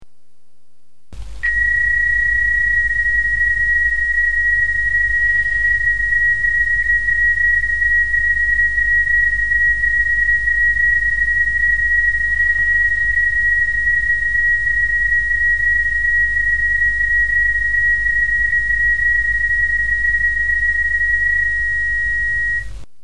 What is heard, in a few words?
psk31